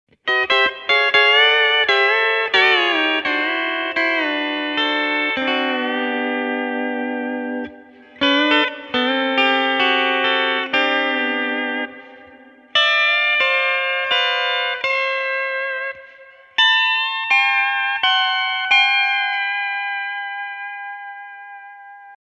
Vibra CA

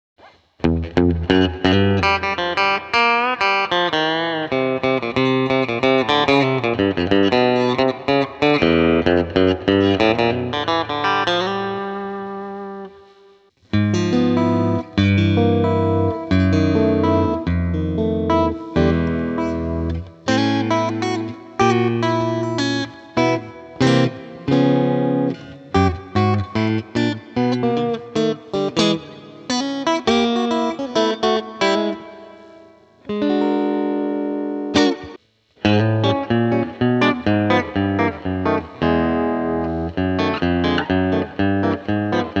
Guitar Spring, AXE FX 3

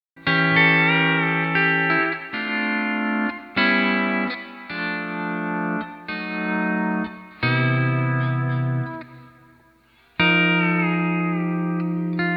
D13 Tab LKV Example; D13 Copedant Tabs

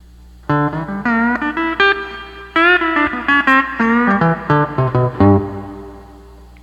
12 String Lap

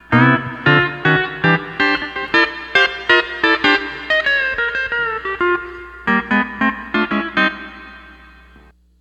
12 String Lap

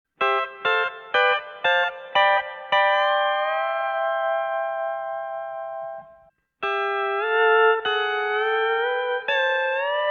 Royer, AXE FX 3

